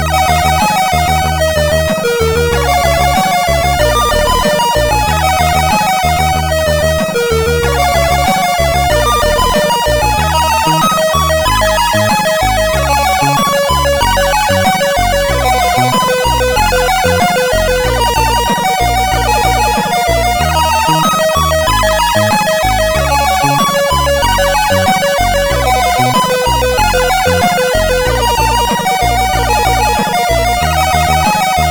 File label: Magic Flute, MOS6581R4